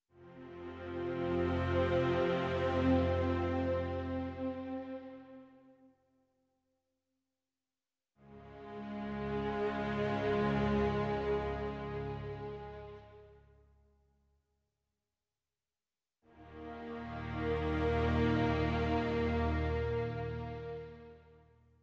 Strings Only

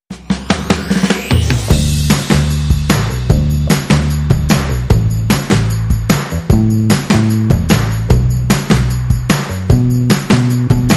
Rhythm and Bass Mix

